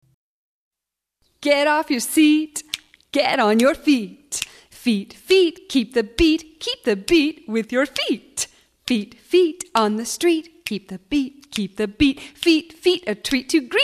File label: Feet Beat